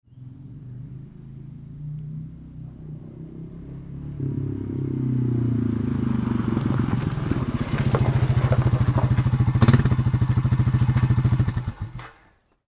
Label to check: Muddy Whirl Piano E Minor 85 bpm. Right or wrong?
Wrong